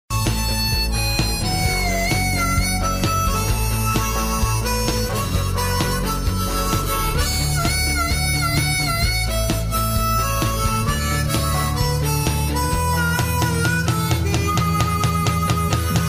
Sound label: harmonica